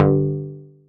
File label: Voyager Perc, Moog Voyager